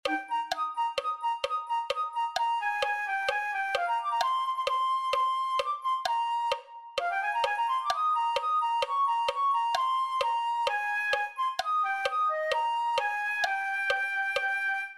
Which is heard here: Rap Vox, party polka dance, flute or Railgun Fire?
flute